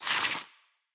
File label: winter tile pickup